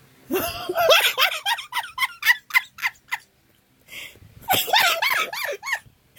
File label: aahahaha